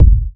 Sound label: short bass drum g sharp key